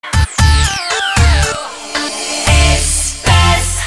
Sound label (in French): Jingles